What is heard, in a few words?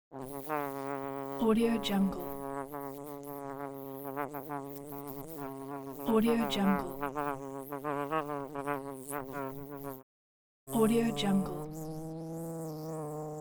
bee cartoon